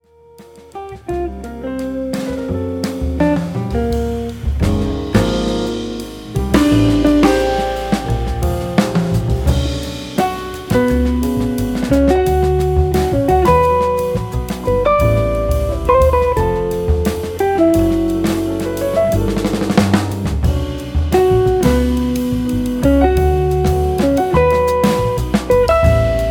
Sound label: Drum bip